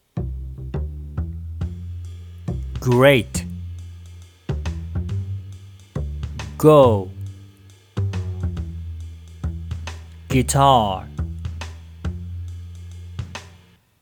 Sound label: Great guitar